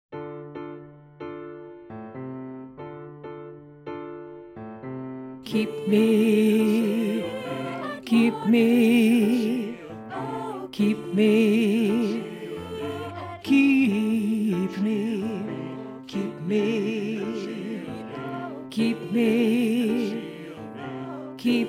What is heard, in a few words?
tenor learning track